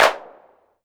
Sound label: Perc, Alesis DM5